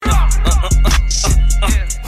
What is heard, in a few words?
uh uh ah uh uh